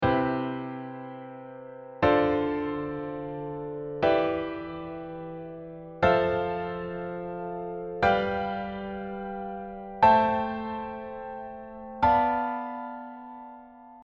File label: Diatonic 7th